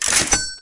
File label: kachingggg